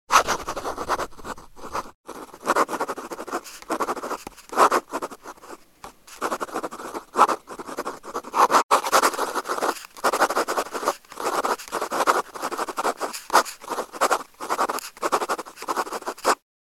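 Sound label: testing a pen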